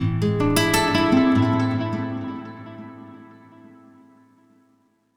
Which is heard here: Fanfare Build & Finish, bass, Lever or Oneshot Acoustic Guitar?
Oneshot Acoustic Guitar